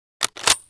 kar sniper reload start, weapons foley; Spearhead Demo; MacFormat 2003 June

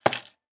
make furniture; Immortal

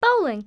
bowling

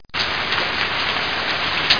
rain

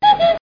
Not A Basket

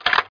slide

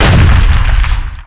Supergun; SpecOps2 MPlayer Demo

